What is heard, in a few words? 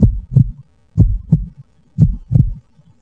Heartbeat; stardunk ios gamerip